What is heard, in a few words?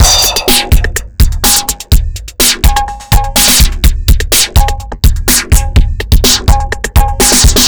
Drums Strange